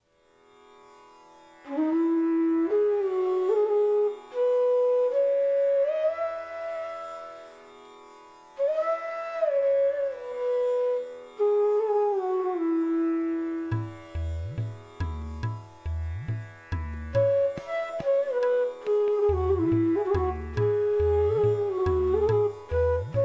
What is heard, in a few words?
Raga Bhoop Bansuri; Bansuri Student Zone